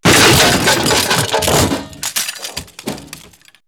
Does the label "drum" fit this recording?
No